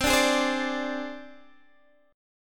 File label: C Suspended 2nd Sharp 5th, down, Guitar, Open D